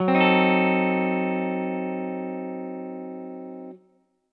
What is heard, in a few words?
dim chord